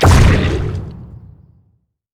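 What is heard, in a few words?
lets bounce small; LoL; zac base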